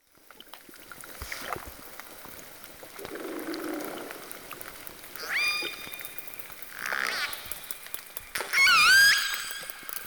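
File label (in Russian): звук кита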